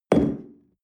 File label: espresso upset tablecloth medium